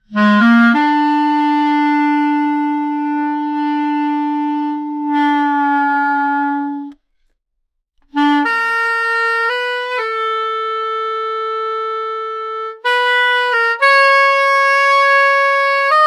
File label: dark cartoon musical